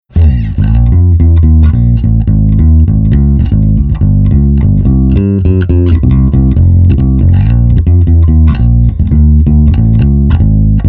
Bass Amp Sim Cabi On 8x10

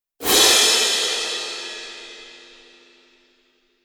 cym hit

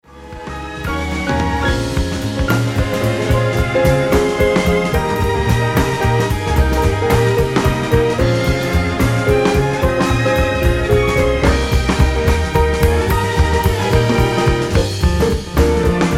Strings Block

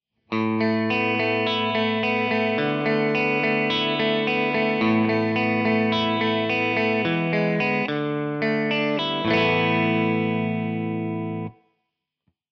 guitar Arrpegio

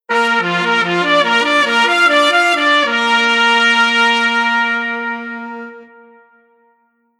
Horn Unis 2 Tps T Sax

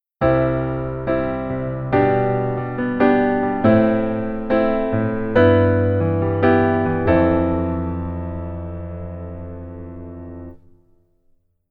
Keyboard Rhythm Basic

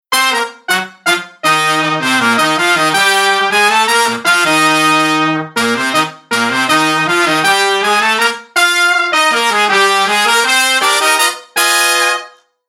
Horn Mix Room On

